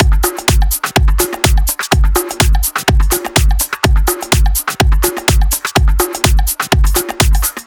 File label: Drums